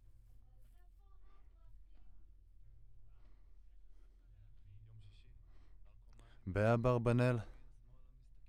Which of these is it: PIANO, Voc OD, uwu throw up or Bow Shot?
Voc OD